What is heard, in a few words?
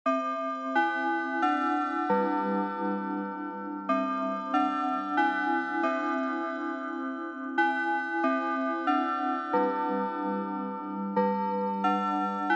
Japanese School Bell Fast Long